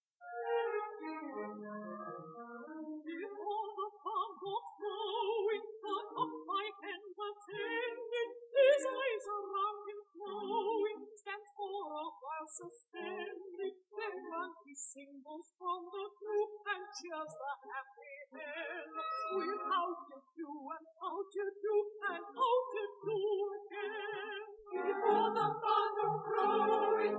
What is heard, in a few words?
air